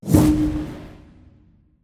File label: dun; pixelheros